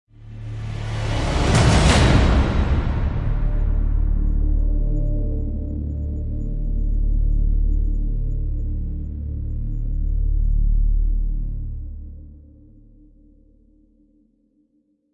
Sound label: Hit Title Petroplyph (D); Brand X Music; Brand X Music Catalogue - Editor's Essentials